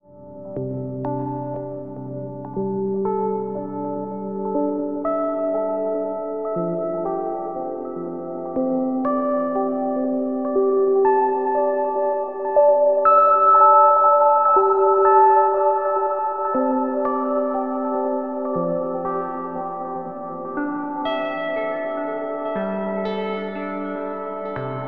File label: AMB 7TH, AMBIENT ARPS; Zero G; Malice In Wonderland